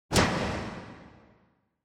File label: Motion Slam Reverb